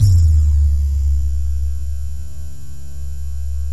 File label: 808s